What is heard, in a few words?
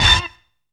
MACHINE STAB; Zero-G; Funky Elements